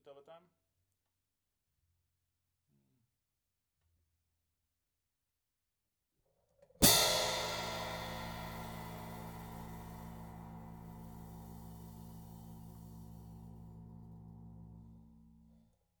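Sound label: Hi-Hat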